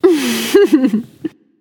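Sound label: heh heh, female; smite; voicepack; hera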